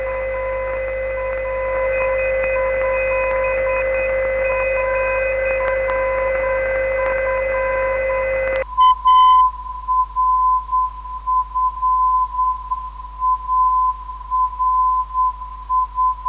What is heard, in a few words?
383.5khz, ndb